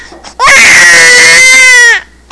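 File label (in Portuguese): GRITO CHORO, SONS PEDIÁTRICOS; PEDIATRIA; PEDIATRIA UFF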